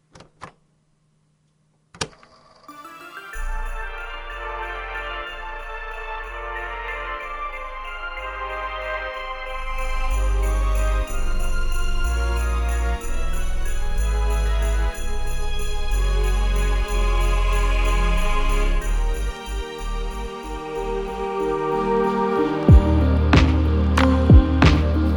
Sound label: Classical Lo-Fi Remix; Ballonlea